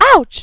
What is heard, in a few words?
pain